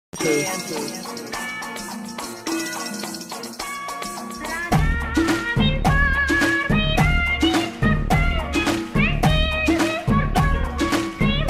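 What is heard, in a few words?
remix song love song tamil bgm tamil song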